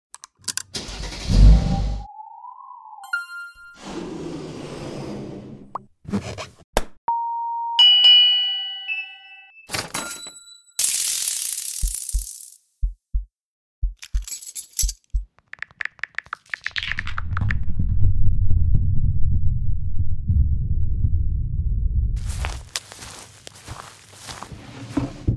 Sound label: Foley; sound effects pack